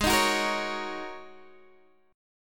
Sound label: G sharp 7th Suspended 2nd Suspended 4th, Guitar, Open D; down; chord shape